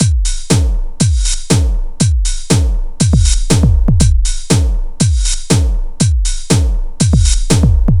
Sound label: 120 trance beat, Drum & Perc Loops